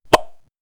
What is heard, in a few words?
anime surprised reaction